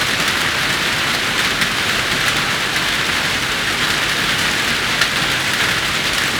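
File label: rain foliage heavy; Call of Duty Modern Warfare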